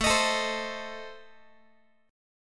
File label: A Diminished, down Guitar; Adim strummed; JGuitar